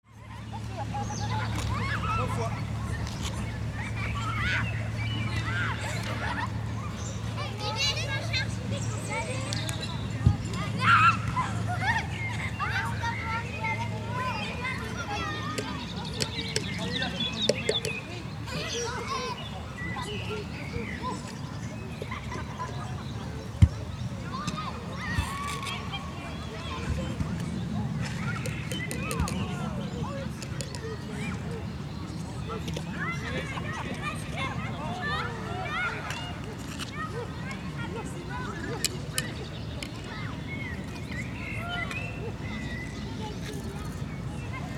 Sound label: Beach resort ambience birds people talking and laughing